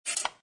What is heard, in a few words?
Look Card